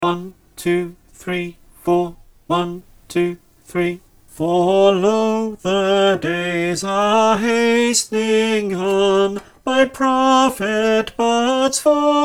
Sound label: unison, choir